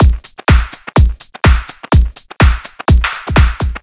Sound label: Swing No hats, Drum Loops 125bpm, Nu Dance Electro; Zero-G - Soundclash; Swing Injection